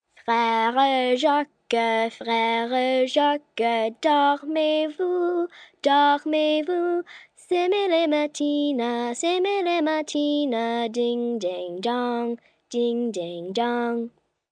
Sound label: Singing, 1 child, French, sweet, girl; kid; female